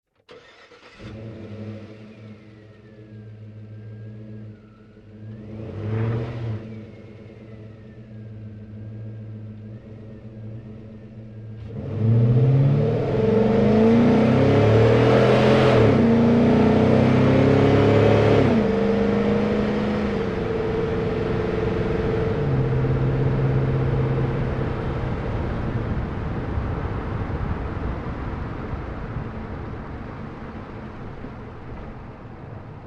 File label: Truck GMC Savana Onboard Drive Accel Decel Fast; Trucks; Big Room Sound